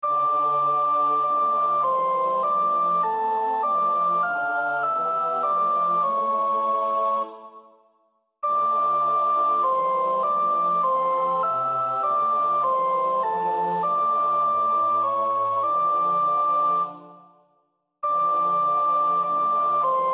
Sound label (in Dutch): Psalm 2 vers 2 en 4 (sopraan)